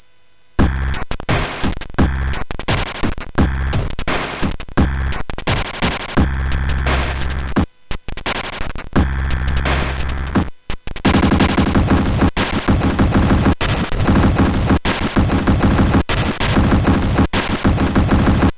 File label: Drum n Bass, CSG8580R5; sid